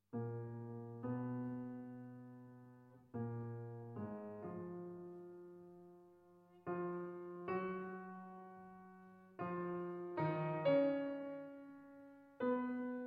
Trumpet & Piano; I. Andante

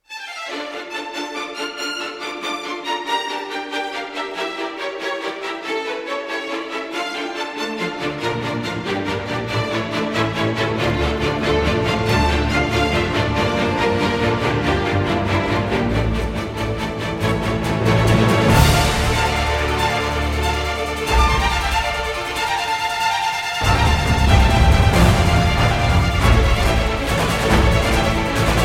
Instrumental

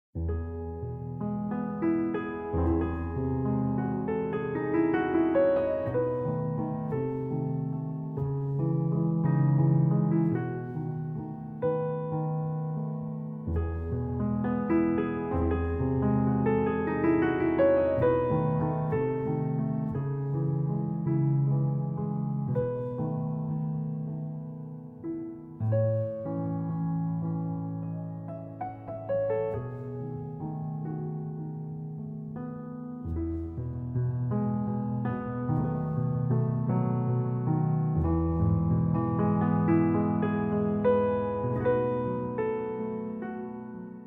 Solo Piano

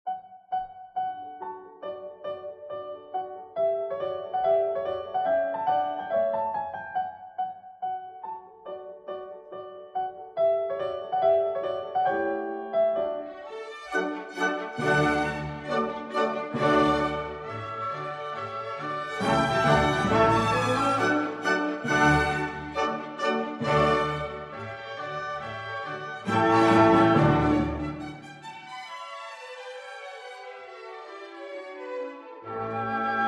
Piano Concerto in D Major, Allegretto; Piano Concerto No. 26; K. 537 Coronation

